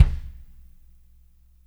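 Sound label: warm kick, NATURAL KIT; Spectrasonics; Burning Grooves CD2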